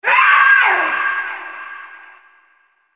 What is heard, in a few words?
scream, scary sounds